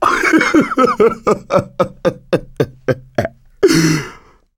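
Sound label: laughter, male; survivor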